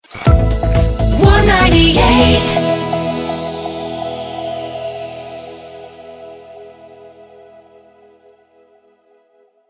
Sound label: Weather 124bpm Stinger; Warm 98